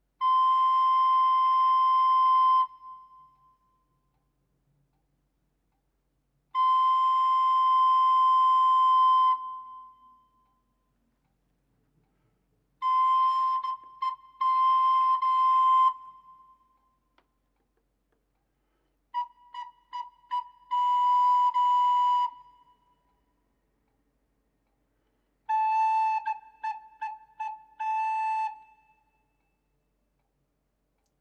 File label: Staccato